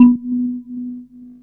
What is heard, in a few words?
Marimba